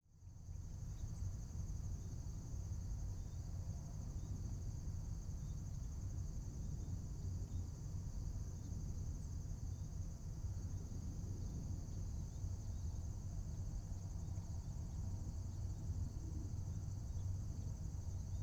Ambience Nature; AdobeCC

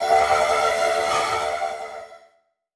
Transition Sweep Laser; Production Element

